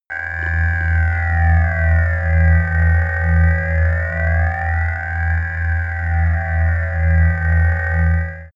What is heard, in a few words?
sci-fi ambient scanner low; drones